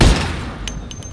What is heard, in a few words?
Remington 870 Police; weapon